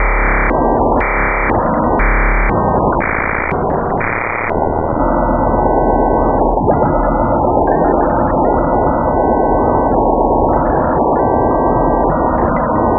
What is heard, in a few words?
Atmo FX; Sonic Foundry (Sony Creative Software); Leo Cavallo Bass Taster; Special Fx